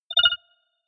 notification spot; soundpool

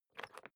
briefcase plastic latch lock key turn; industrie wirtschaft; bueroarbeit

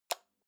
light switch on

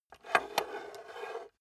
metal scrape on wood rattle impact

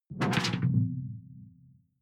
sci-fi alien bloom; swooshes-swishes

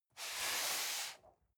cardboard box medium slide short soft; objekte

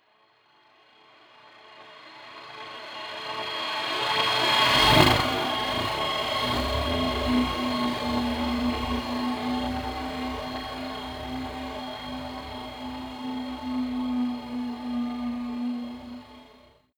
sci-fi deep sea, atmosphaeren; soundpool

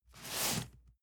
cardboard box medium slide short; objekte

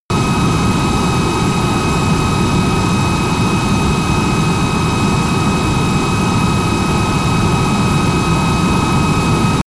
military jet b-1b lancer turbine idle direct; Airplanes - Jets

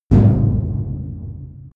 medium metal impact

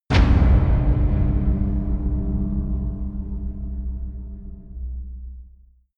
lax feedback, metall; hits explosionen

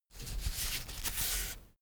toilet paper sheet handle fold, badezimmer; haus-garten

